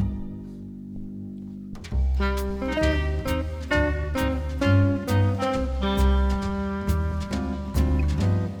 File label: jazz